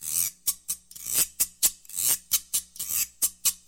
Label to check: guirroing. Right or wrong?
Right